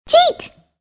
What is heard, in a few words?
connect; monstergo; pokebot